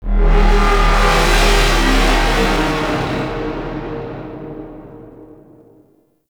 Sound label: Sentient Vessel Marrowtick Launch; Warframe; Tau; Abilities